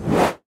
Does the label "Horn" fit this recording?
No